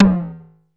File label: HIGH TOM, DRUMS; Music Store Sound Service; Einstein